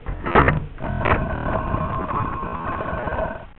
secret door; wolf

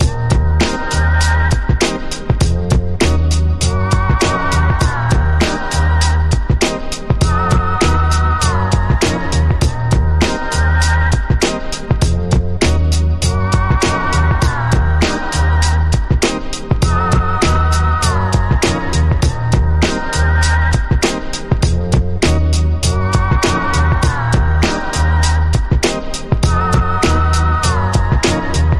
new drummer

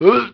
zombie pain, male

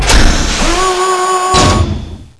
lift move

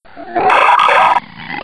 zombine alert; scmod

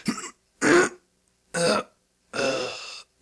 death; police

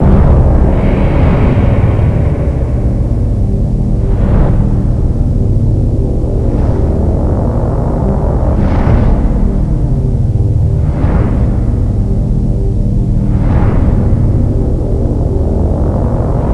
vision

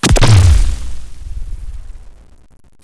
plasm shoot

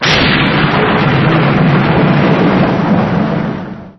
thunder

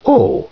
oh

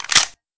mp5 bolt slap, weapons

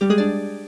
pkup

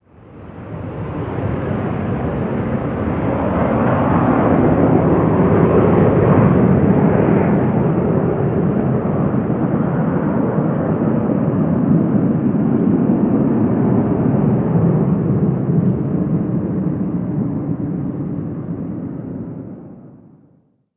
plane overhead; ambience

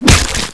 hit

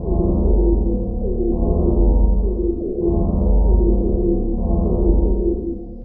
alarm dome full

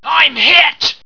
trooper pain

